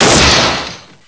door smash

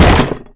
box metal impact, debris

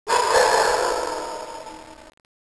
dog howl

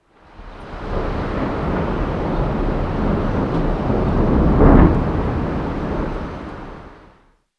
lightning

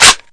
m4 mag tap

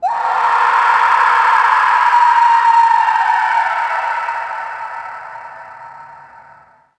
scream

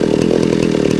chainsaw idle

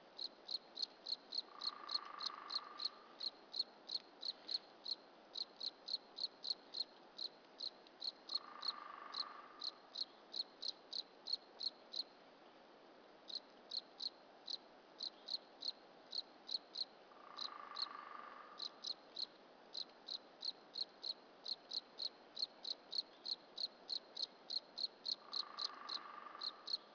crickets woodpecker

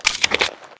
ak47 clip out

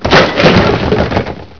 bust crate, debris

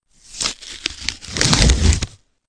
bandage

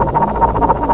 boiler machine

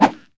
knife slash; rtcw

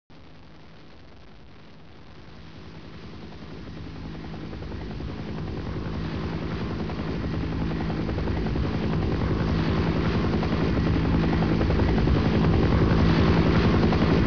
flypast

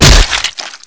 gib splat

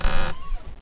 creak

